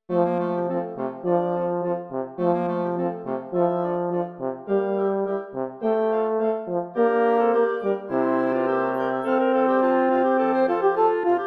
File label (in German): Horn